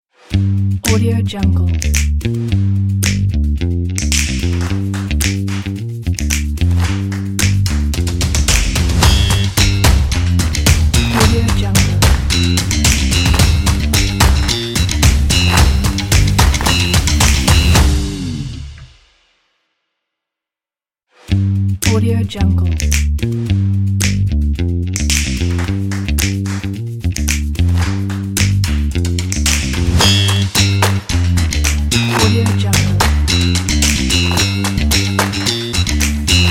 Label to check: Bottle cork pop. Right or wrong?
Wrong